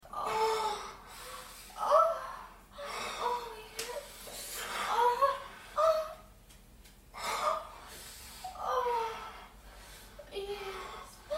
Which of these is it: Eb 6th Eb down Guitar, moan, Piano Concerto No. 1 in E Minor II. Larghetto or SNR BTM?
moan